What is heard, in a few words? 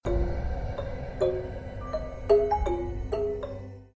Plink Plonk louder